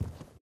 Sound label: foam floor walking; SFX; PlayerWalk